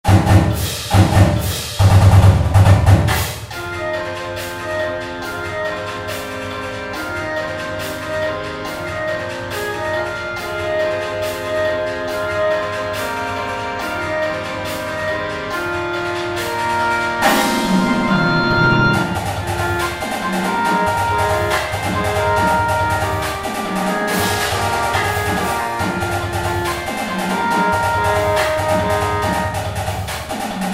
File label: Battery